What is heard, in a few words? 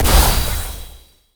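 magic explosion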